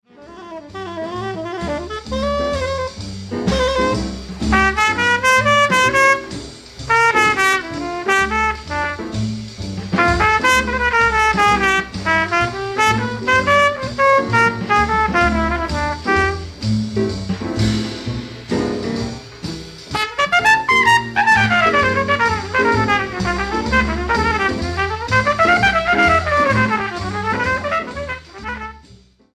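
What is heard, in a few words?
trumpet solo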